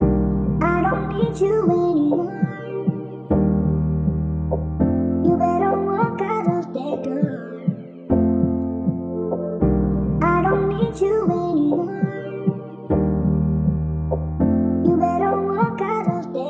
100 BPM G Min, Melody Loops; I Don't Need You; Cymatics; Orchid Sample Pack

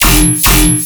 Bass Hit F, Dubstep; Necrotic Surge; Ghosthack; Demolition

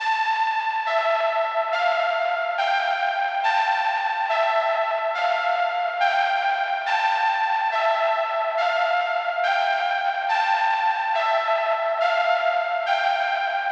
140 BPM D Min Prophet 6 Lead; Merciless

